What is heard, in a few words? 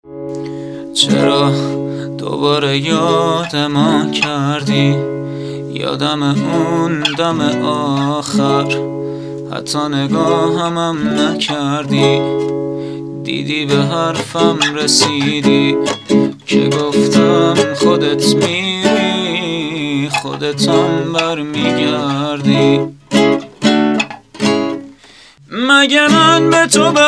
Guitar version